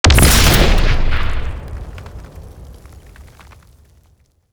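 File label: tripmine explosion boom; weapons; Half Life Alyx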